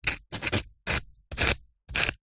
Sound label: rock knife tip scrape scratch; foley